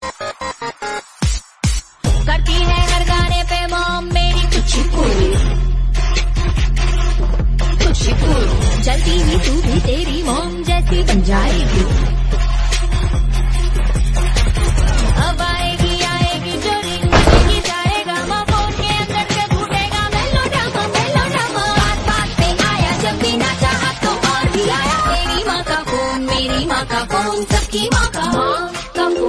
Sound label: Female Song Ringtone